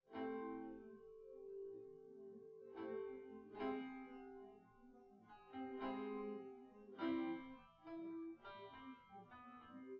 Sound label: nodrums synthconv